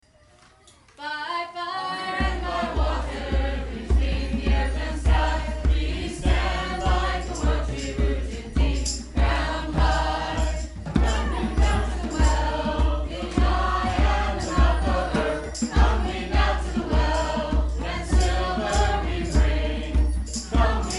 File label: chant portal song